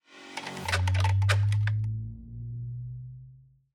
rev up; battle

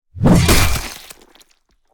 steel warhammer; battle

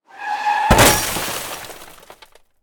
crash; milebymile

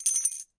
income; coup

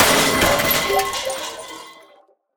out of gas; milebymile

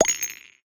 steal gem; pirates

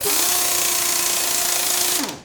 repair; milebymile

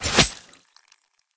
claw; battle

